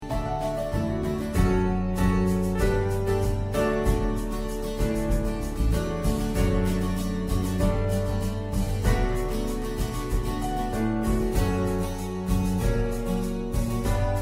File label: instrumental